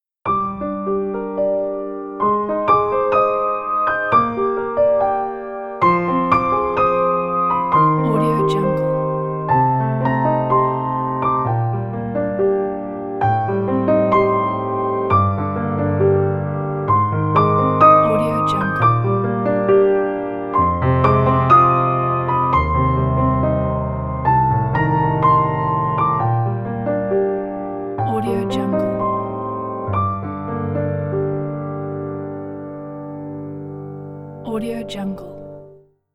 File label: piano